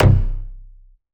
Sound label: Bass Shot C